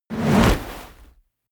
coloss movement swing whoosh; kreaturen

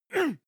male moan pain short soft; koerpergeraeusche; menschen; soundpool

